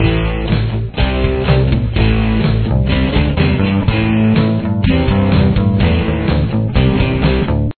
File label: chorus, guitar